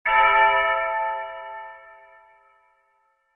cerkovnij kolokol odin udar vdaleke

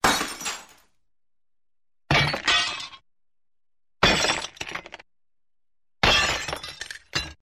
steklo byotsya

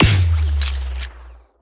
drebezzhashij lazernij luch

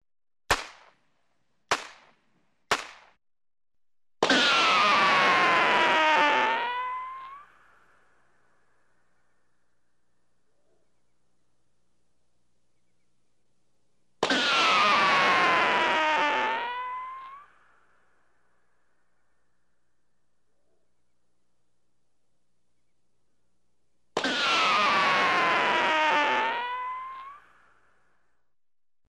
duhovaya trubka duhovoe ruzhe vistreli rikosheti

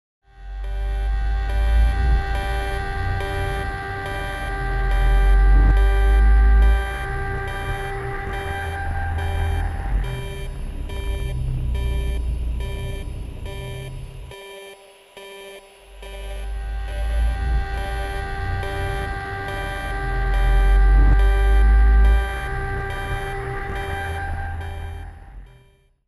signal uzhasov triller ozhidanie fonovij zvuk

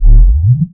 knopka cifrovoi voshodyashii blizkii yarkii

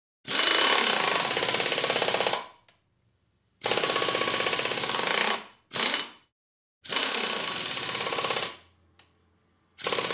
medlennoe akkuratnoe zakruchivanie perforatorom

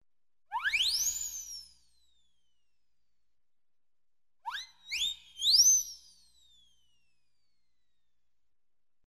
multyashnij svist

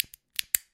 dva shelchka cherez pauzu

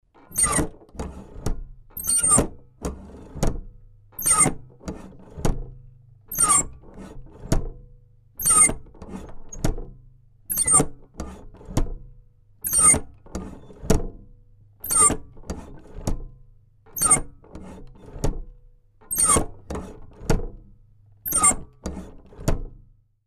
skrip prisposobleniya dlya pitok

